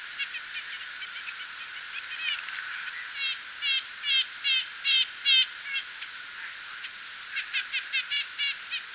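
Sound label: red-breasted nuthatch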